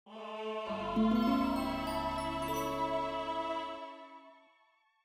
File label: Eerie; Indiana Jones and His Desktop Adventures; reworked midi soundtrack